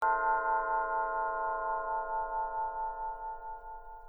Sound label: bells test